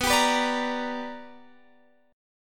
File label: B Minor Major 7th Sharp 5th Guitar Open F; strummed; JGuitar